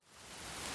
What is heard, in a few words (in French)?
pluie fine; mirumiru